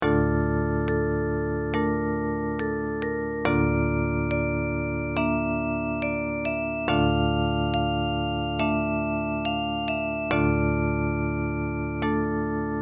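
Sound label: lullabies vocal & guitar; 4 lullabies; mokko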